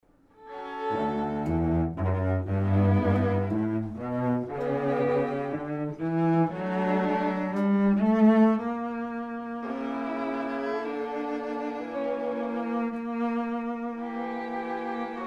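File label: string trio; sokolov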